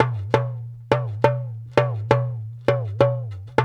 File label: JU JU, African Contemporary